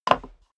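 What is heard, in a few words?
step dress boots wood creak single stair down, footsteps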